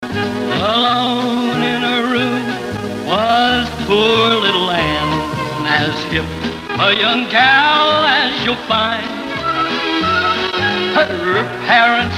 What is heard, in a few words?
jingles montage; 1961; crc tune toppers